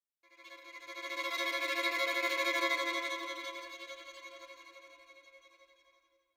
Symphonic Hit Strings Tremolo - Single, Lower; Hollywood Edge; sound designer's toolkit v2

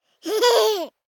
BABIES LAUGH Boy 12 months Short; Baby Boom

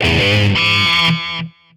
Pickup Guitar Riff; SFX Kit; Pickups

